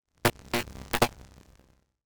melee attack impacts magical effects lightning; psfx

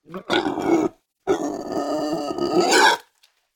pig grunt; sonniss gdc

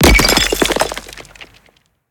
designed impact brick rock dirt gravel multi lfe; sonniss gdc

